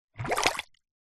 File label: nature water short bubbles bubbling air blow into water in plastic pot